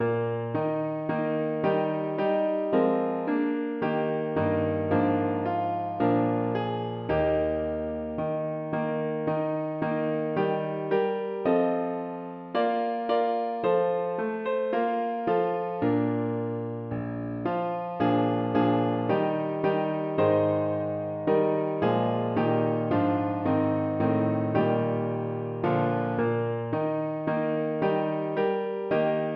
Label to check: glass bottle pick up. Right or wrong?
Wrong